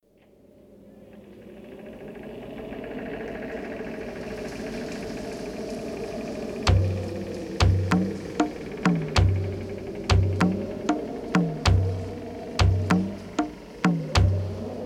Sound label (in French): rap tout instru